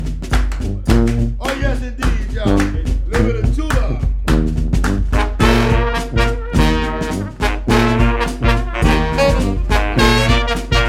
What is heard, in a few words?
Tuba